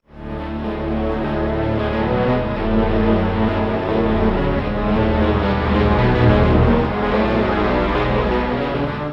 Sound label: strings; pitchshift; celemony melodyne